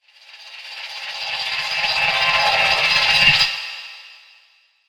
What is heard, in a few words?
Hatchet Horror Transition; Scary Soundscape Sound Effects; Andrew Fleming